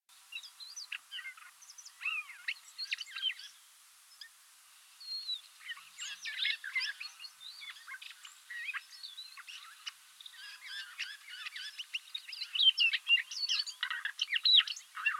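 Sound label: solovey krasnosheyka voice, Siberian rubythroat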